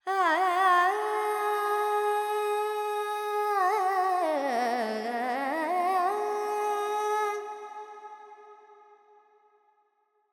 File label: FX Dorian, Vocals, Carnatic Female, Alaps, 70 G